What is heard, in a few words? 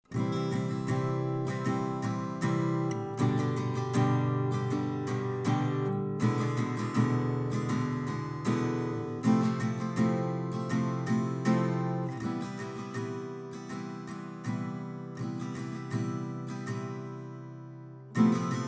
Glam style strumming